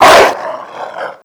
dog bark; Call of Duty Modern Warfare

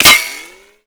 impact metal; Modern Warfare

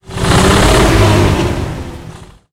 panzer3 engine load, tanks; War Thunder

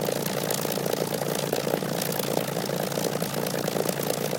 engine plane rpm00 close; War Thunder

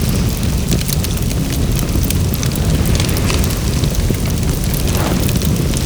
fire wood trash medium

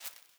ui pickup seeds; Rust

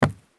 sprint wood; Escape from Tarkov

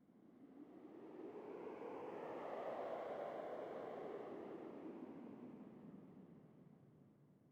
sting wind gust; Rust

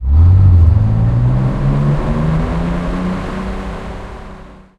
valentine engine int load, tanks

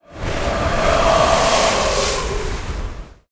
ambient battle; World Of Warships

